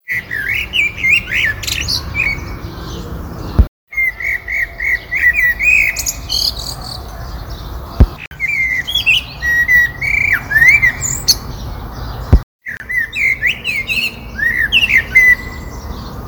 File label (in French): Merle Noir Chant